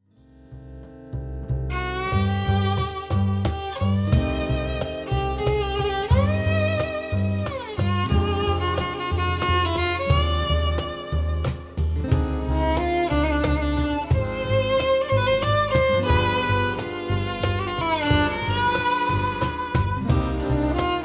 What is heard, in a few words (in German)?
Violine